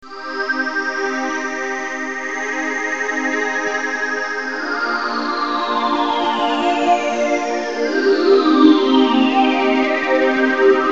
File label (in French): phaser